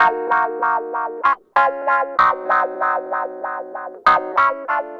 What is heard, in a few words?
Clavinet, 70s Analog Funk